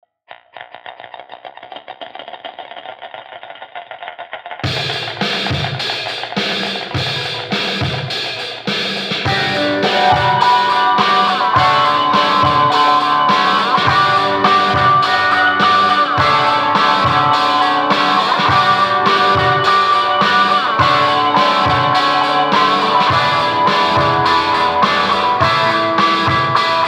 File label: Summer Dance Inst